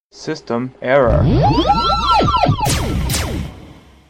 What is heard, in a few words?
System error